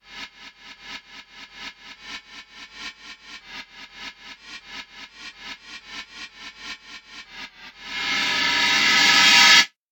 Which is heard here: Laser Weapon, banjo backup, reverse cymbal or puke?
reverse cymbal